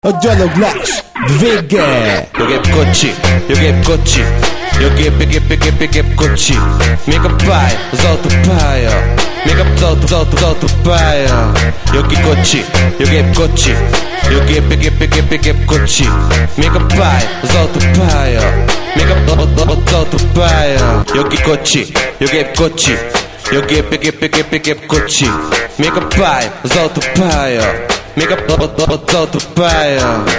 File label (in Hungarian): csengőhang; magyar pop